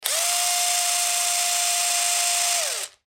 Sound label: Mini Impact Wrench, Mini Impact Wrench Turn On Run Turn Off 9.6 Volt; Power Tool; Industry; Power Tools; Blastwave